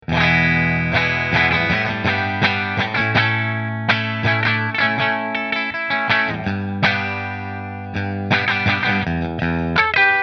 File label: Godin Bridge Fender; Godin LGXT Trans Blue